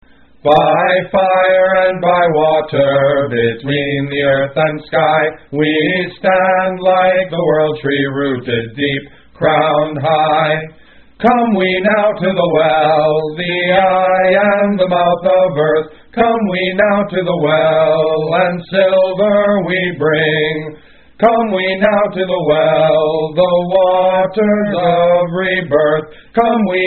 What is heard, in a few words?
portal song; rituals; chants